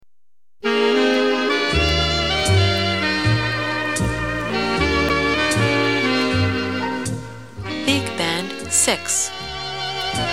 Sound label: bigband